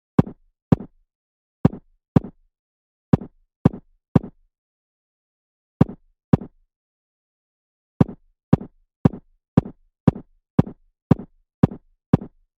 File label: walking